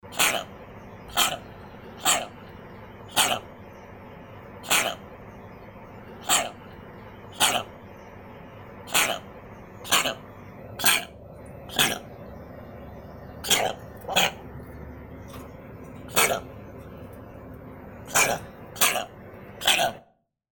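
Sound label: hyrax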